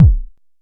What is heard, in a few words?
analog attacked, Kickbank